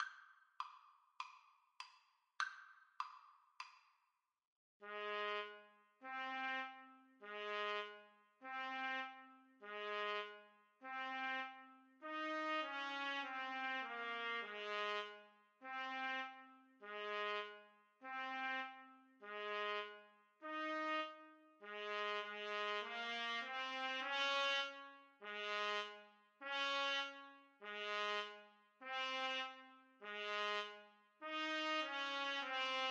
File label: alabama 2 TPTs